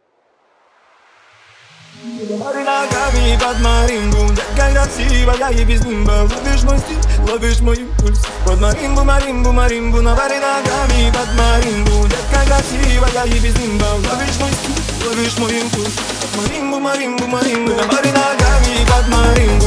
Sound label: marimba